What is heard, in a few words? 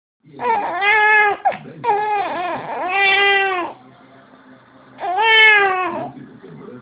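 baby hungry, male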